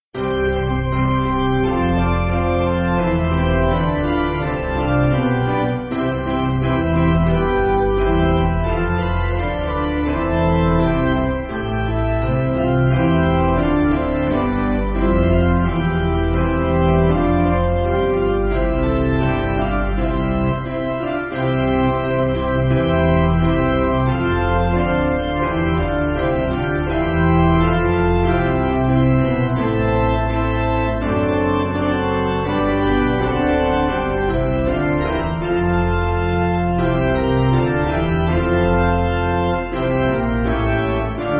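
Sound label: Organ Piano; Credo; Wooding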